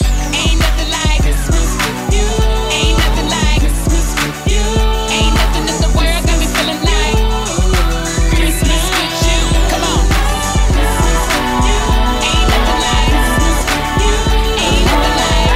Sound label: Holiday Rap Singing Original Song